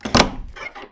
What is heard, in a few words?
door Open; Kenney's Sound Pack; RPG Sounds